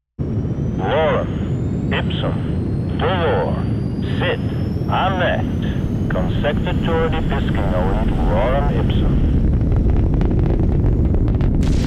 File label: Rocket Launch